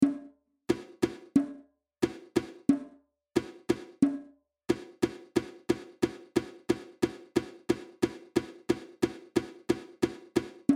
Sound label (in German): Trommelkanon